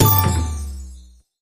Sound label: increment stop; games; Aztec Gold Megaways